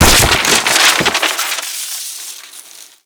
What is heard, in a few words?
explosion mailbox paper; Explosions; Call of Duty Modern Warfare